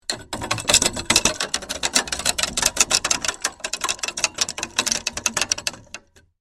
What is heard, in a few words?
Rusty gears mechanical rattle